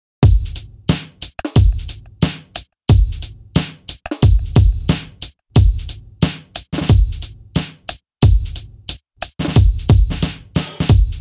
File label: Beat Juggling Pattern, DJ; DJ Breakthrough